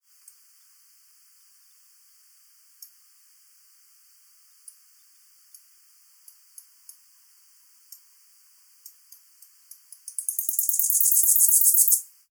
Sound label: unzen tsuyumushi